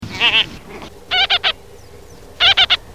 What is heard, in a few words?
Philomachus pugnax